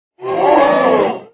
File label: crowd gasp